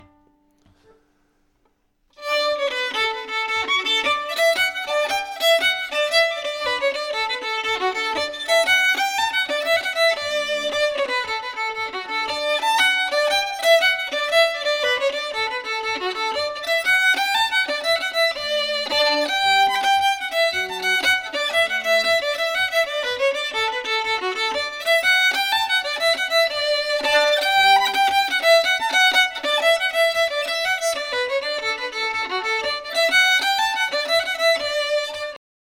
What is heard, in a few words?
fiddle; SeamusGrantFestival; tune tutor